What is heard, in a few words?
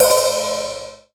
Sabian Aax Hihat 14 Open Strong